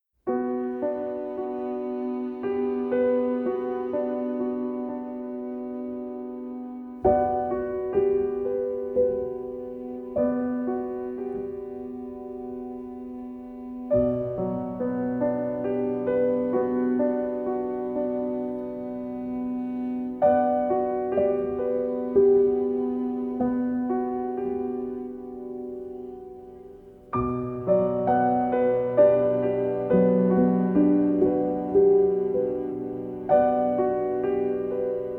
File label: frozen lake arr for piano cello